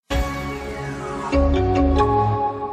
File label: intel inside